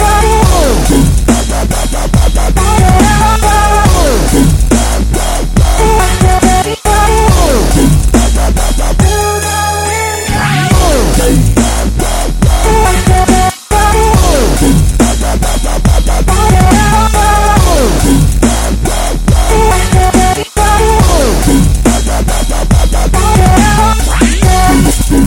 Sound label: Dubstep